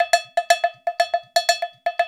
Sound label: Island Bash; Spectrasonics - Supreme Beats - World Dance